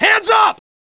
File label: police; cs1.6; cstrike